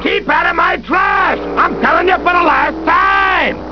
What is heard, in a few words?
trash